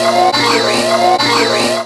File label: 140 FRESH; USB Soundscan vol.20; Fresh Disco House I; AKAI; DISCO ADD